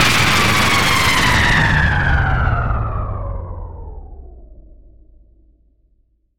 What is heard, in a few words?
Drop (Power Down, High)